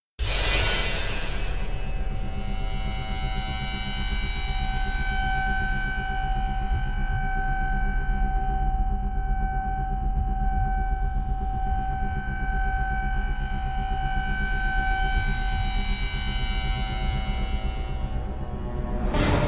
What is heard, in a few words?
instrumental